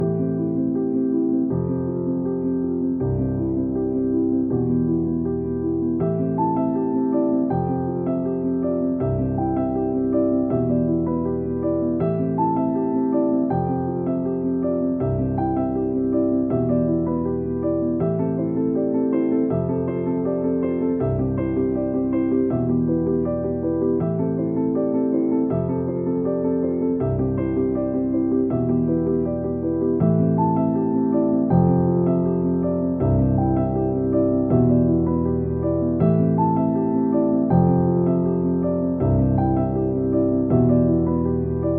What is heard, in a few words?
Spring Vibes; Android